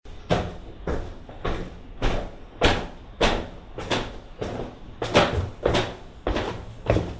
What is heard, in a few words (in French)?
Pas escalier